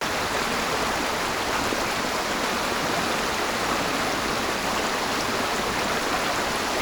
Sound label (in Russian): vodnij potok techenie vodi